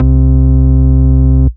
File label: Ultimate Bass Root C; Vengeance Samples